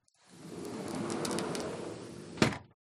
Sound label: Door Sliding Glass Close; uberduo